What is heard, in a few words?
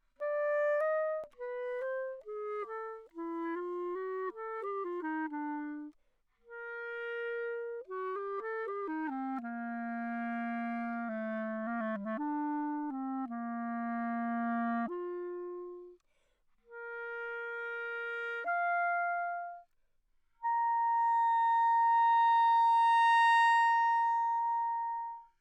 piece, romantic clarinet bb, Anechoic; OPENAIR